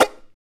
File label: CHARANGO FX; Spectrasonics; Hans Zimmer Guitars VOL-2